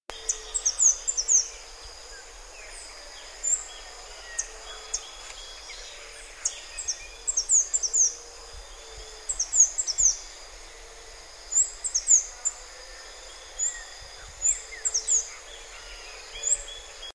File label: Saí Común, Conirostrum speciosum, Chestnut-vented Conebill; Coerebidae; cantos